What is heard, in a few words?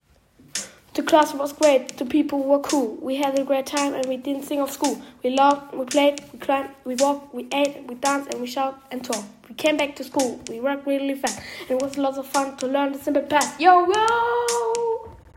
School rap; aus dem englischunterricht der 6c